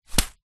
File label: leisure juggling bean bag small catch in hand; zapsplat